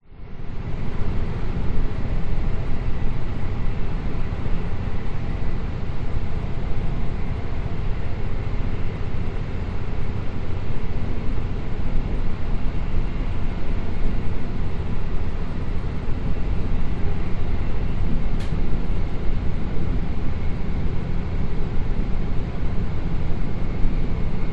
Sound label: vehicles train internal pov moving slow into station and stop tannoy few voices; qld rail